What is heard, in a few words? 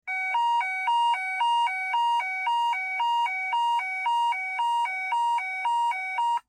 China police; 911 Signal